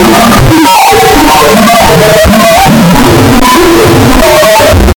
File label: cry